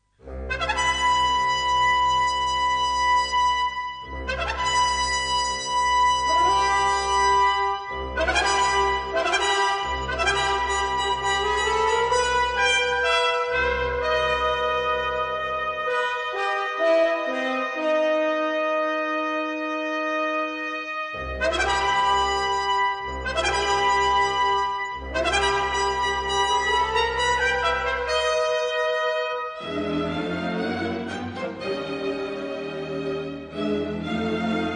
New celebration orch

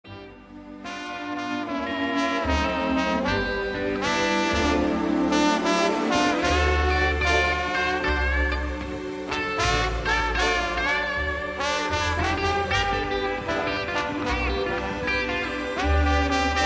New blues colours

